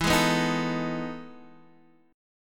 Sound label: E Major 11th E down Guitar Standard; EM11 strummed; JGuitar